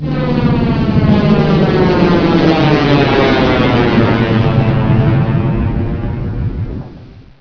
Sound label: alien flyby, flyer; svencoop; echoes